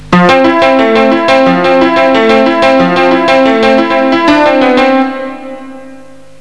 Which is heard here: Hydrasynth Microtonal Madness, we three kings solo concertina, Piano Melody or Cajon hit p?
Piano Melody